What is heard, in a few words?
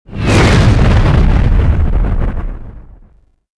meteor hit; movers objects